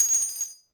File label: casing bounce hard